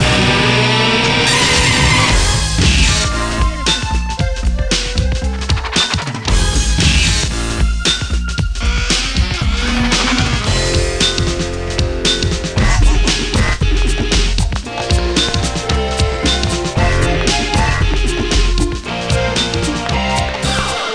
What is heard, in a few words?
Walking Music